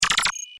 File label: neo arcade movement